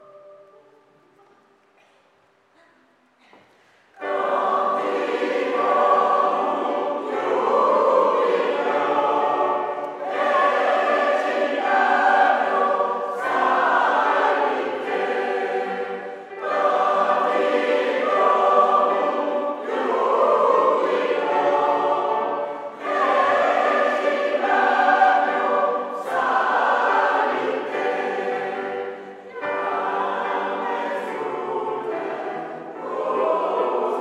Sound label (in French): Canticorum chant commun; Concert du 29 Juin 2024 à Sales